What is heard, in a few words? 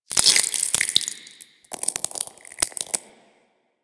Taunt Flesh; Warframe; Proto Infested Ancient; Abilities